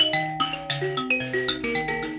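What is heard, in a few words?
grand mbira phrase, African; Roland LCDP11 Africa VOL-1; M'biras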